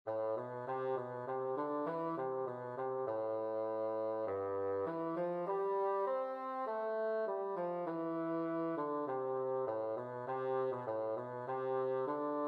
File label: bassoon